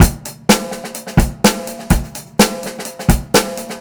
beat, Roots Funk; USB Soundscan vol.41; AKAI

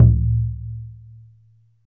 MALAY DRUMS; Spectrasonics - Heart of Asia CD2

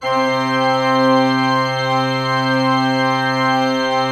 C3, cathedral organ; Propeller Island

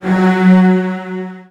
Virtuoso Strings; ILIO; Kirk Hunter; CL 16TH

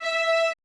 E4 R, Orchestra; AKAI Advance Orchestra Upgrade 97 Vol.1

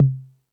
Beat Box; E-MU Pro-Cussion

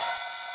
Open High Hat

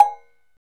TUNED C, Cowbells; Roland - Rhythm Section; Latin 1

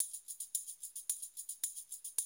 110, Classic Drum Loops

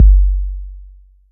BD 808

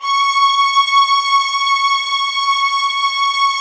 C#6, soft violins; Q Up Arts; Denny Jaeger Master Violin Library

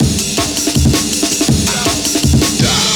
Amen Break, Loops; m8 breaks

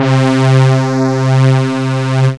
BIG BRASS, SYNTH FUNK; Zero-G; Funky Elements

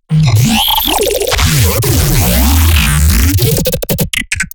glitch stab; Virtual Riot; Glitch Stabs

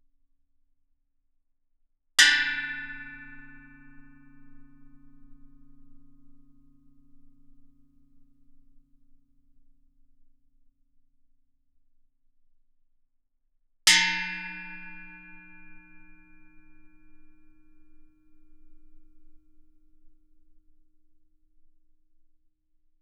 Gamelan; Propeller Island - Complete Gamelan

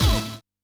HIT, Yamaha DD-65

